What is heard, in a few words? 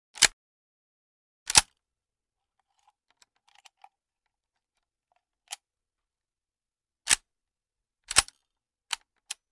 22 Ruger Rifle Bolt, foley gun movement